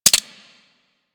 M16 Rifle Mech; Grineer

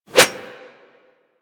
Claw Swipe; Manic; Attack

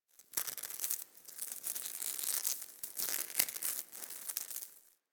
Tendon Stretch Small Close; Ambience; DE; Warframe; Infested Eris

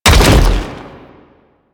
Land; Warframe; Sentients; Erra; Foley

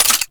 Sniper Zoom Out; DE; Warframe; Warframes; Frumentarius; ExaltedSniper

